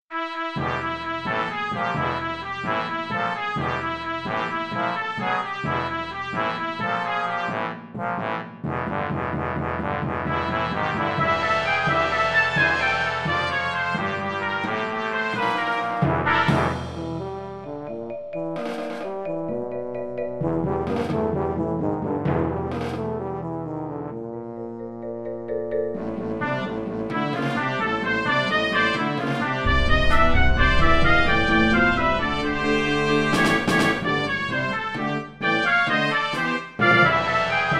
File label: brass, 12-tet